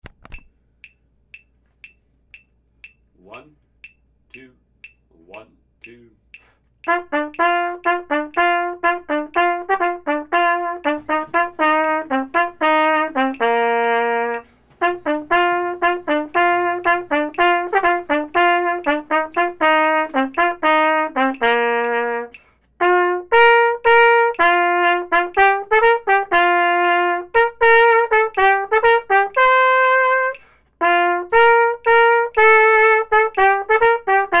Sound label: marine hymn